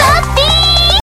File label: Chant Wappi